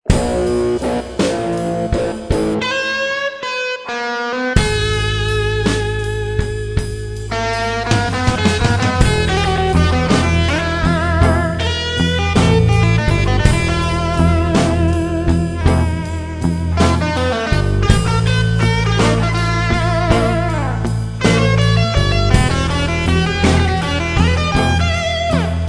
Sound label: slow blues guitar